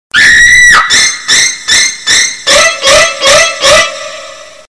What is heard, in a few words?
Horror Scream